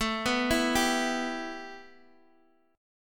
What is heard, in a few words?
A 7th Suspended 2nd A, Guitar DADGAD; A7sus2 arpeggiated; x,x,7,4,7,5